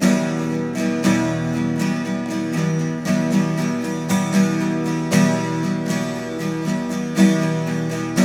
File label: 12 STR MIN F, TWELV; Spectrasonics; Hans Zimmer Guitars VOL-1